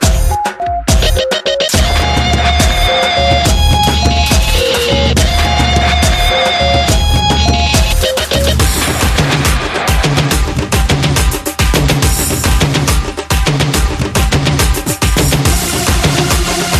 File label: Tamil Kutthu Love Bgm